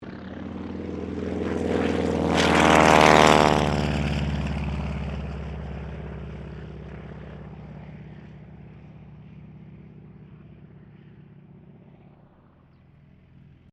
AIRCRAFT CAC CA-25 WINJEEL TAKEOFF, fighter trainer; RAAF Winjeel fighter trainer; Airplanes; Vehicles